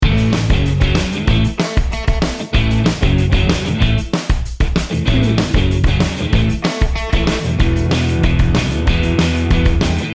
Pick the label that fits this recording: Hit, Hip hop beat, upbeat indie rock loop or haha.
upbeat indie rock loop